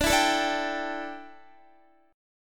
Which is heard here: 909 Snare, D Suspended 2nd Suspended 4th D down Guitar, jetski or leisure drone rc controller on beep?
D Suspended 2nd Suspended 4th D down Guitar